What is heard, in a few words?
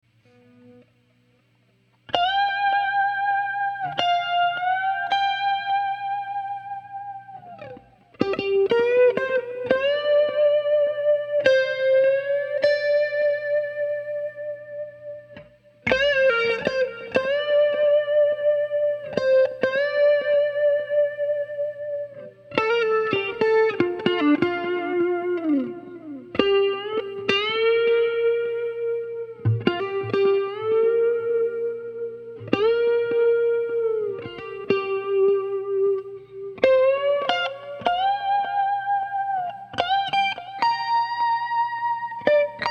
clean intro EMG Strat neck pickup